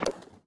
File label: menu tabs move, user interface; gmod; mw; iw8